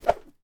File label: chest swipe